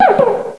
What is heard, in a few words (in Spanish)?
Grito de Cubchoo